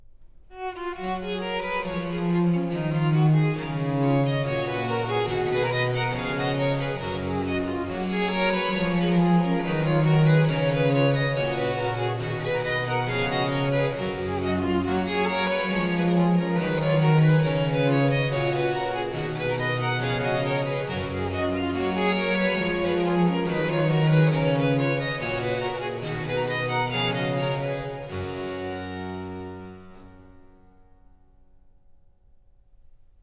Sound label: harpsichord solo, Canons on the Goldberg ground; BWV 1087; V. Canon duplex a 4; Bach; Musikalisches Opfer BWV 1079